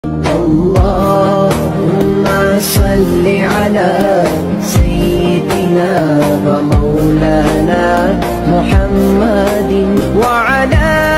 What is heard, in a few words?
arabic ringtone